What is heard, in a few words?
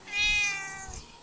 meow; space kitty